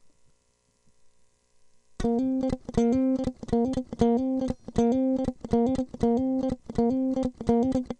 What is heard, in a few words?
guitar